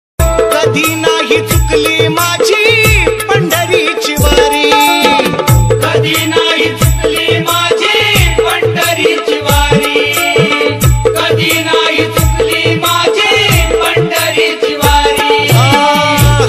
Marathi ring ringtone bhakti ringtone god ringtone; devachi ringtone